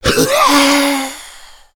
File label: smoker death; voice